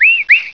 Whistle; PC; MARIOSFUNDAMENTALS

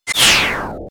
enemy boss die; SNES; SMRPG

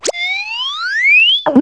Cartoonish Sound; PC; MARIOTEACHESTYPING2

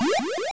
suction; GBA; MLSS

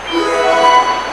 start; GAMECUBE; SUPERMARIOSUNSHINE

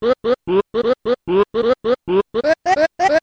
dancing Spear Guy; N64; YOSHISISLAND

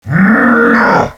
wrawr; DS; ML BOWSERS INSIDE

